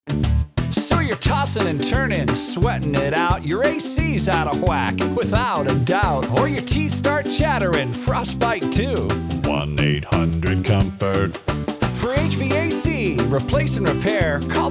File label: Quirky Fun style, hvac heating cooling jingles; 800 Comfort